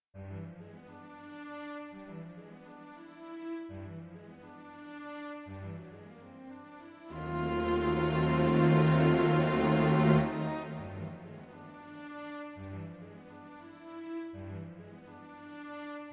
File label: strings